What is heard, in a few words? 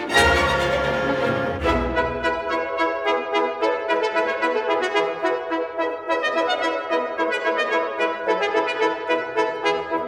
suite for orchestra, Toccata; Pulcinella; Stravinsky